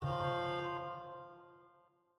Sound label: instrument note